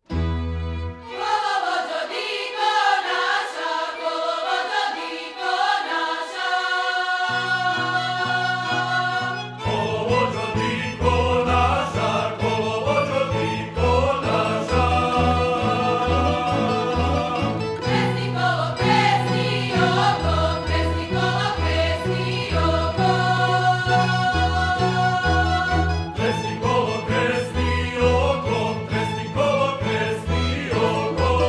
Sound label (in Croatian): Dobrotska poskocica, folklorni ansambl